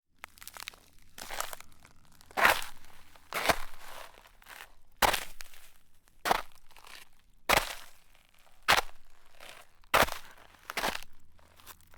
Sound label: walking in shallow snow